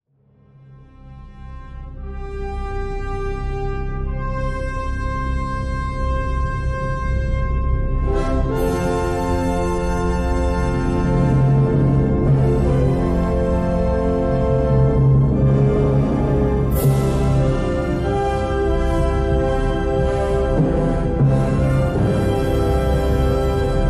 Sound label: music